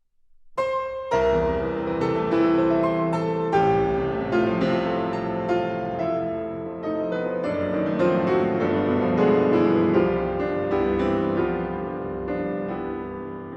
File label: Piano Pieces, Intermezzo in A minor Allegro non assai; Murray Perahia; Brahms; Six Piano Pieces, Op. 118